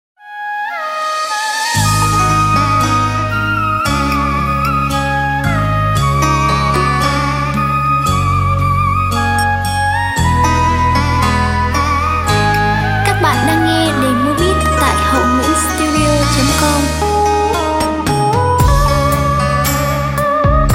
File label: beat, playback